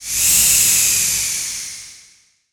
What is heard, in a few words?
summ ice